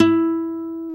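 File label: GUI NOTE, acoustic guitar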